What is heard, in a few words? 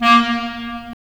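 wind clarinet; Roland - LA Composer vol. 3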